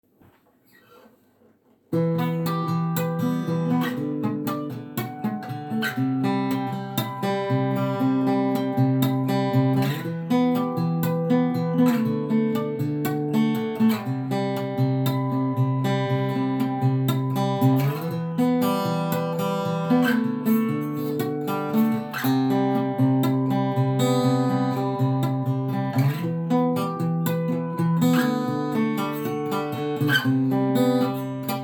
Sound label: Acoustic